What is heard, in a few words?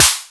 SD, korgdrums; Korg Electribe; ESX-1; electribrary-3